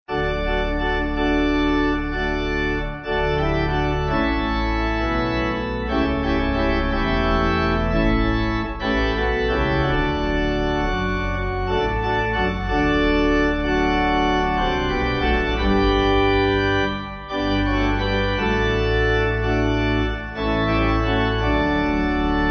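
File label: pipe organ hymn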